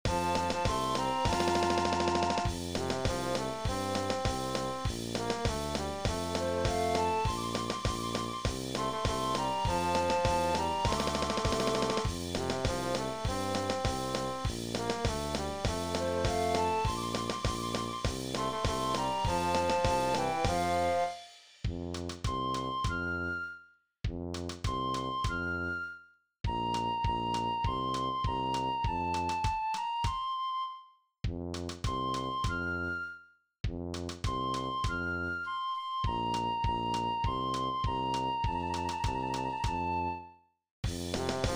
Trompetmars

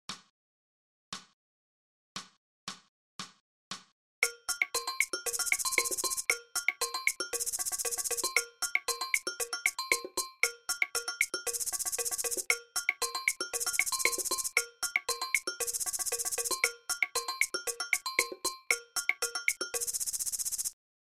Maracas

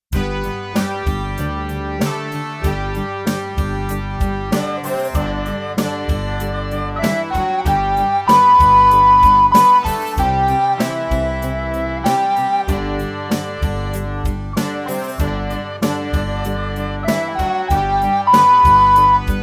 De blokfluit parade

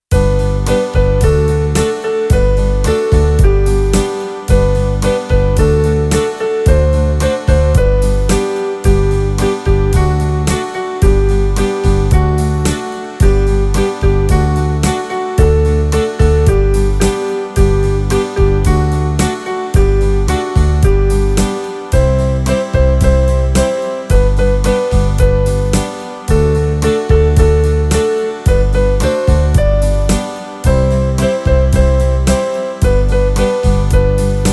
Blokfluitrap met tussenstuk drum HARD

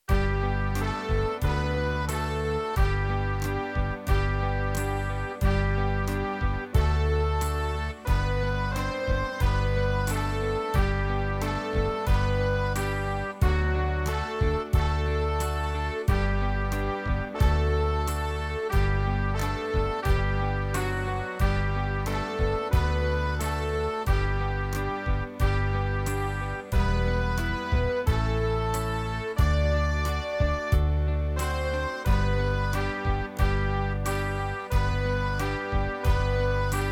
Trompetfeest

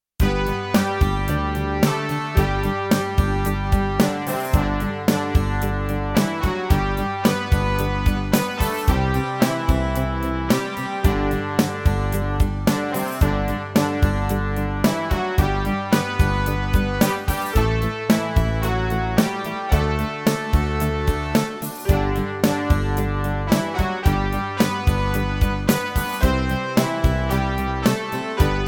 De blokfluit parade

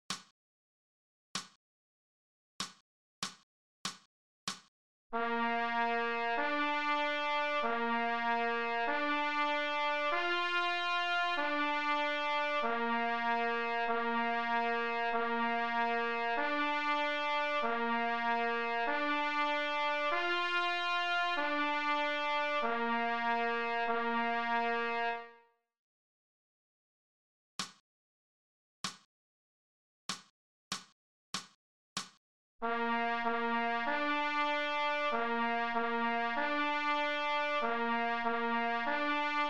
Duet onderstem

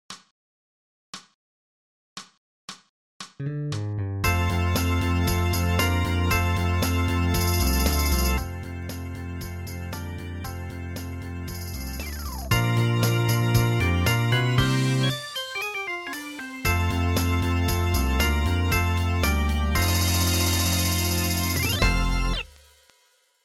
Beatring Tamboerijn

